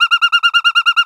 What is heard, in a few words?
Quica Hi Tone